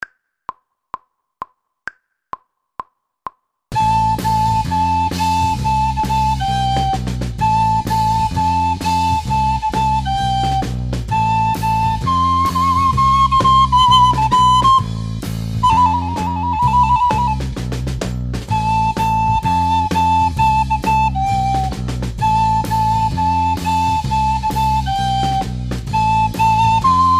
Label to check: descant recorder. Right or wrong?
Right